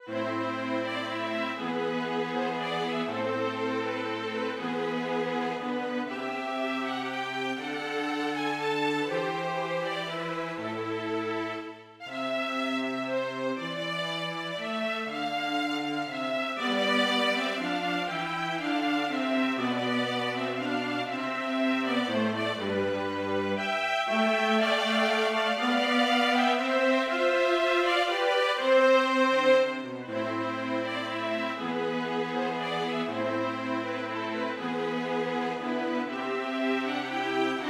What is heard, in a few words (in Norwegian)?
Rondeau for strykekvartett, uten cembalo; Hertz